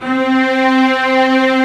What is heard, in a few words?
SECT LEG C3, Strings